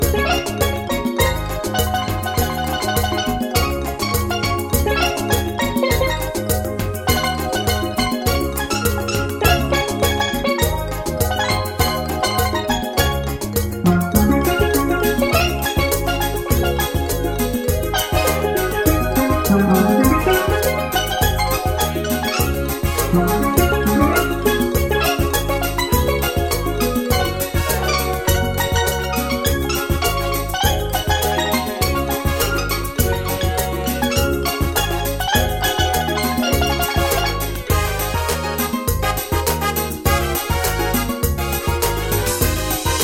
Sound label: Bayon